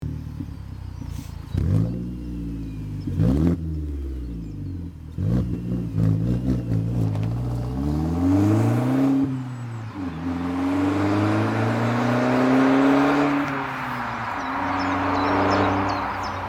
Mercedes C180 Kompressor W204

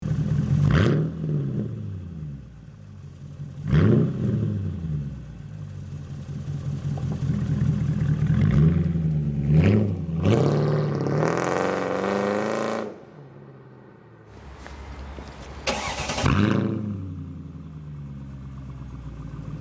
Mercedes CLS500 W219

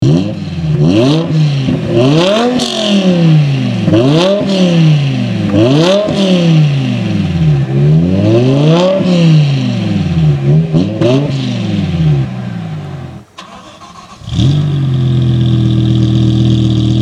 BMW M5 E60

